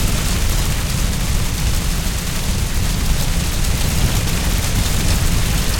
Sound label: Fire Loop Big